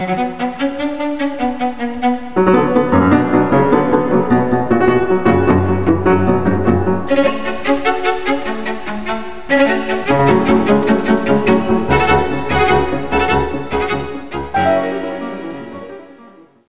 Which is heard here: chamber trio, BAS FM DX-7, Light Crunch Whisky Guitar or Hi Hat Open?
chamber trio